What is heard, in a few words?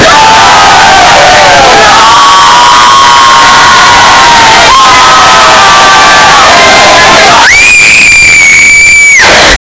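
boys and girls are screaming, concert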